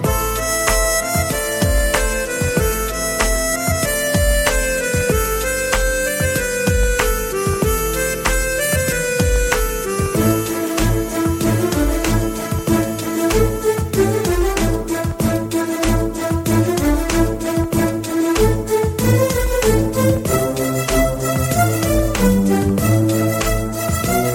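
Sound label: background music Telugu Ringtone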